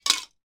mince pie or apple pie style cake tin drop on table